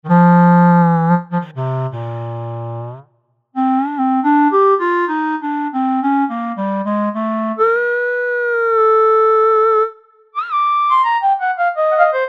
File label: Airy Clarinet